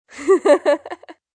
Laugh Kid, Laugh, female, short giggle (human voice); Laughter Sound Effects; Laughter (Sound Effects:Voice); VoiceBox; Twistedtracks